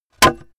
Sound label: sword hit wood